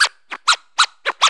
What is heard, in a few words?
SCRAT; E-Lab; X Static Goldmine 2; akai